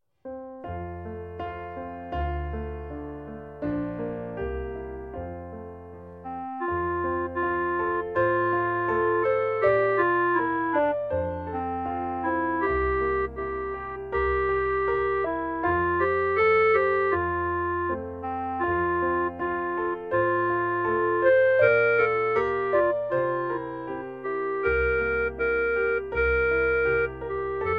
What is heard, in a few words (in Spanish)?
Danza de las flores clarinete; musica I ESO 2015